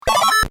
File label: success